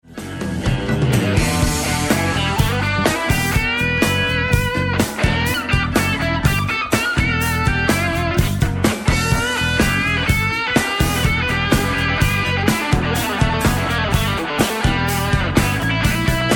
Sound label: solo